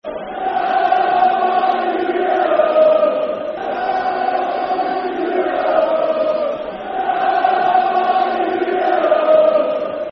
Come On You Yellows! [Football Chant (Soccer Song)]; Bradford City Bcfc